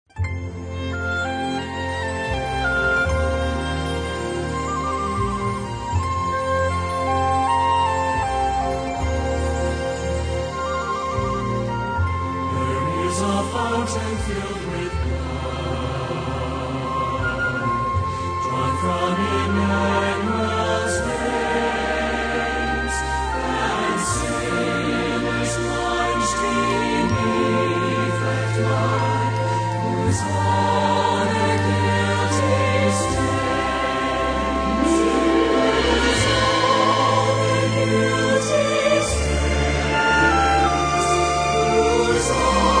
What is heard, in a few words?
Choir And Orchestra